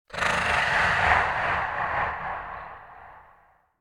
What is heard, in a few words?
Demon Growl Hover - Zombie Breath; Hollywood Edge; sound designer's toolkit v2